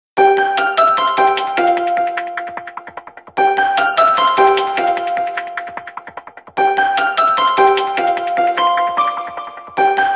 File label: Ring Sizzle, Windows Mobile ringtones; Microsoft; Individual download-only (2008)